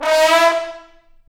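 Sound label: trombone slide; Roland L-CDC-01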